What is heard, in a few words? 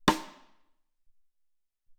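Pool Stick, Alesis SR-18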